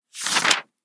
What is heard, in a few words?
page; werewolf